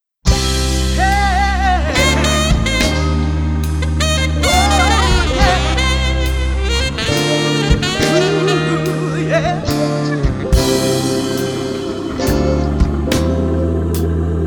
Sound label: Amazing Grace BG Vox